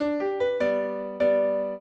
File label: piano; Mozart